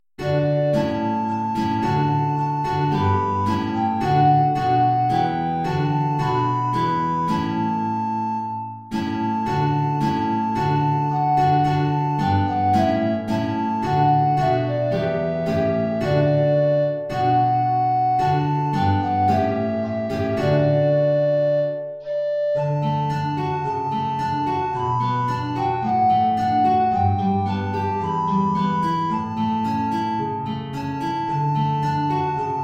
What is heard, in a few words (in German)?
Altflöte & Gitarre; hohe Notierung; Lobt Gott, ihr Christen, alle gleich